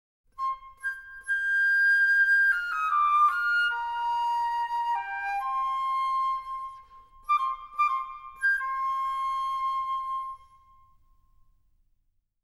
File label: Ocarina; Dragon Quest VI; Symphonic Suite Dragon Quest